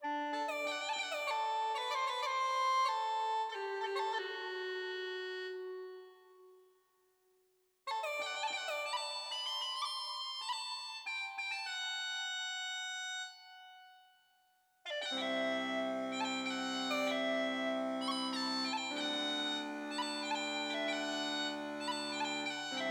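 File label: Theme A, uilleann pipes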